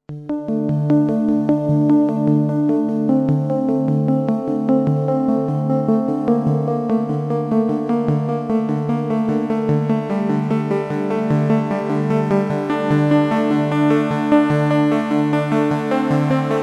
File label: ppg wave wavetable